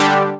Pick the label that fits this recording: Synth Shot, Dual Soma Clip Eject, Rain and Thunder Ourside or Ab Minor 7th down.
Synth Shot